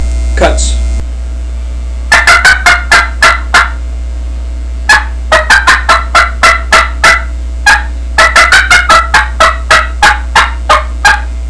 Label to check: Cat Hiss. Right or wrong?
Wrong